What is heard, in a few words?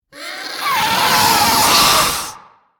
scream air; ice age scrats nutty adventure